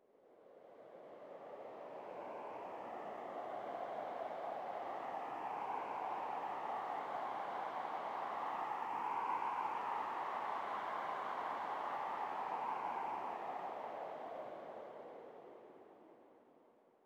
sting wind gust; Rust

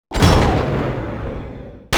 minigun start far; buraq

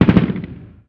gun usassault med

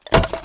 Wood Shield Drop; Civ4 warlords

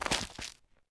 Hoe Dirt; Civ4 warlords

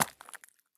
run glass; Escape from Tarkov

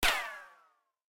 bullet ricochet; War Thunder

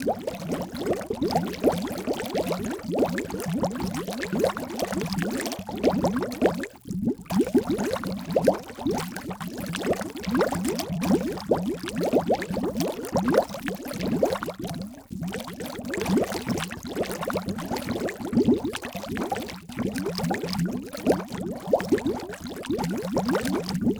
underwater bubbles loop; Rust

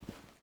m92 deploy; Rust

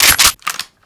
kriss reload chamber, foley

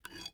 ui pickup metal wood; Rust

